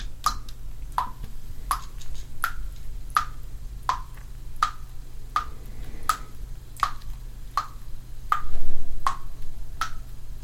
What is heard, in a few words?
water drop